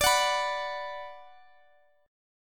B 5th D, chord; B5/D; strummed; JGuitar

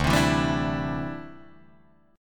D 9th Suspended 4th D down Guitar Drop D

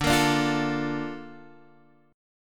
A sharp Major 7th

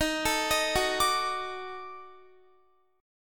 D sharp Minor Major 7th Flat 5th, Guitar; arpeggiated

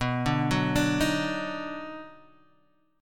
D sharp Minor Major 7th Sharp 5th

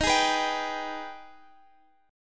G sharp Minor Major 7th D sharp

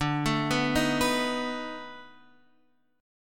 B 7th D; B7/D arpeggiated; JGuitar

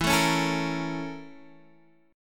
Bb Minor Major 7th Flat 5th

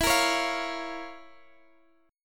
F Major 7th Suspended 4th Sharp 5th E

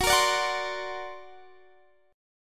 D Augmented 7th Gb, guitar

